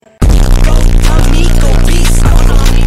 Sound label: fast thug life beat song